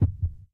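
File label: heart; guns n zombies 2014 pc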